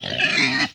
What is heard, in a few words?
Pig Oink